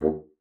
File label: bassoon, D#2; Xsample VOL-6